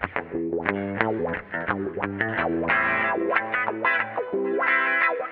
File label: GUITAR